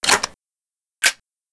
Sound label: smg reload; weapons